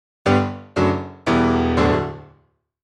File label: Full Release Off